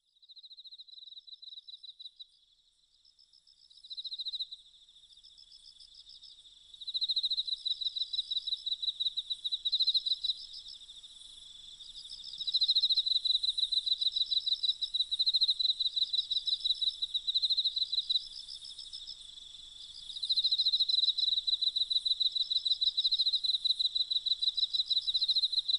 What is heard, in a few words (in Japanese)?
膝枕・あまあま耳かき; 担当:サキ